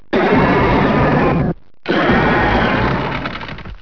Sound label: Aggro roar